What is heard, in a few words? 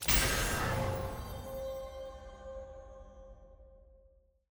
ui button click